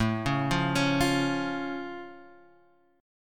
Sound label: C sharp Augmented, A